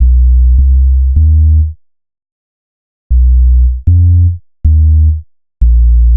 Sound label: Bass, Futurist Drum & Bass